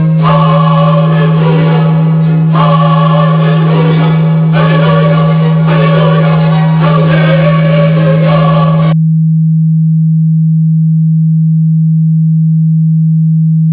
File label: handel hum; Phase Vocoder; Frequency Domain